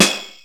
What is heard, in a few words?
RY-10, DRUMS + PERC, YAMAHA; Rubber Chicken Software Sample Bank